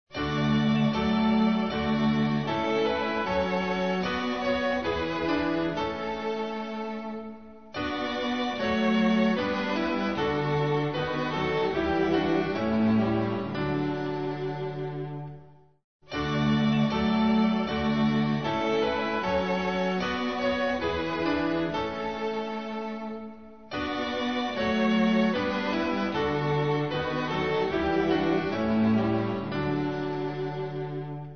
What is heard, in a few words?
a mighty fortress is our god instrumental; hymns portuguese